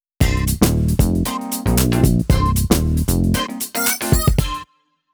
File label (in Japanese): ジングル